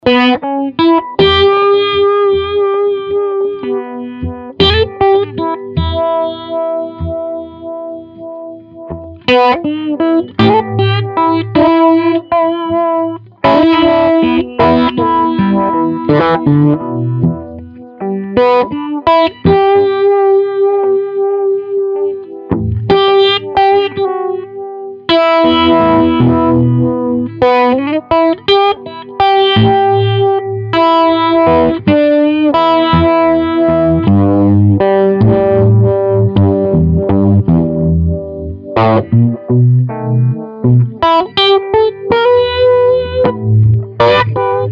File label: RetroVibe TubeDreamer72